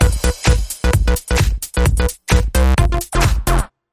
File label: Sting